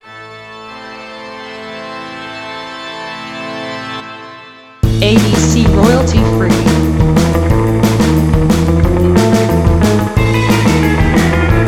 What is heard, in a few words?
Halloween surf